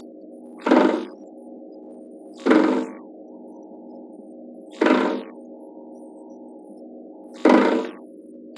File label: Meriones persicus; Tonal creaks of an adult animal at discomfort